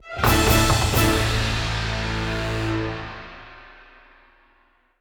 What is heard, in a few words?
Tower Completed; Pokemon Sword Shield The Isle of Armor Super Music Collection